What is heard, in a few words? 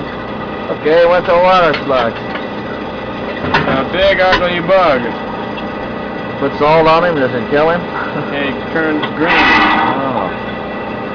water slug, sonar